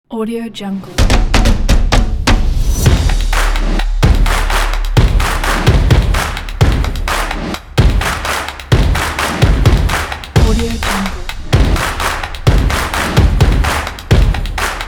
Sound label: claps